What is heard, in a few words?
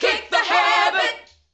kick; VOZES